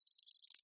Insect Rattle Shake, Insect, Rattle, Shake, Bug; Animals:Insects; Insect Movement; Blastwave

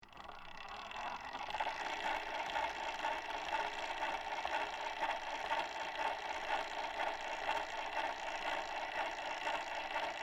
Phonograph Run, Motor, Machine; Technology; 1895, Berliner, Trademark; Miscellaneous Electronic Sound Effects

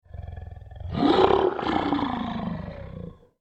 lion growl; Big Cats; Animals; McKinneySound

